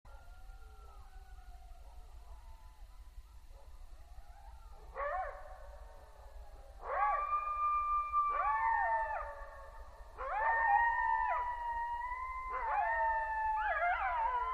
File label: Coyotes and Owl